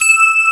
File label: starbell e6